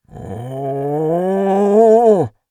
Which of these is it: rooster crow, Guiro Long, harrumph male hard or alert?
alert